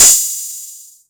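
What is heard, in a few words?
TR606 Hat overdrive, Roland TR-606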